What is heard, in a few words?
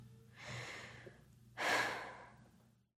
exhale